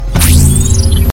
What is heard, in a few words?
Interface Open